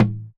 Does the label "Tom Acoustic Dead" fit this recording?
Yes